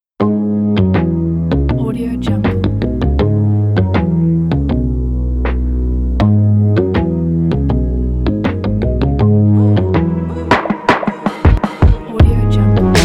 electro trends electronic pop

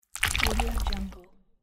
mud squish; tibasound

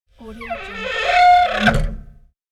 iron door creaking closed; electronplanet

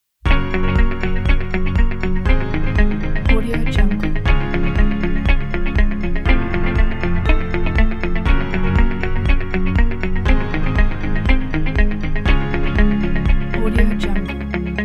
dreamy tech

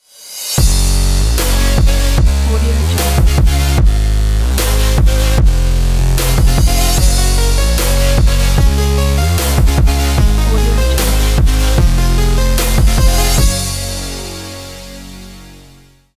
intro future bass logo

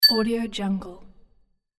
happy ding

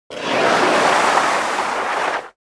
debris dirt dirt grind

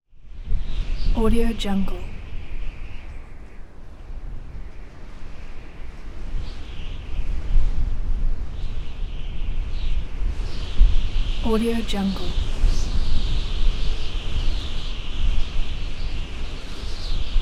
desert howling wind

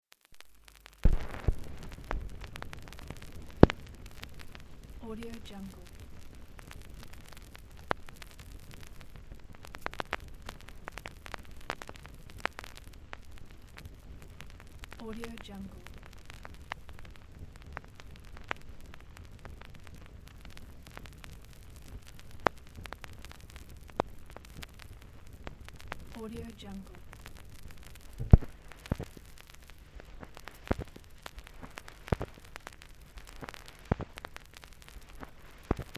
vinyl record crackling

